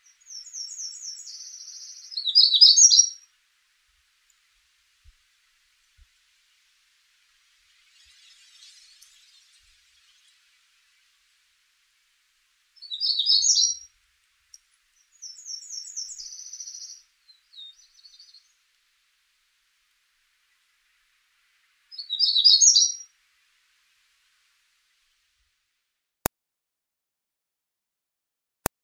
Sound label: magnolia warbler